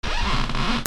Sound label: SQUEAKS